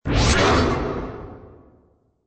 hit, robot; mob

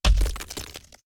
step, krampus

